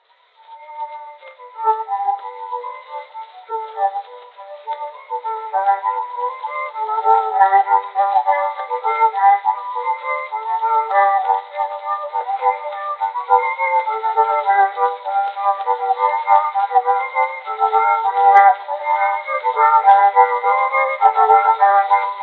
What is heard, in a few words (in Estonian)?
Labajalg, hiiu kannel, folk; Hiiumaa; Reigi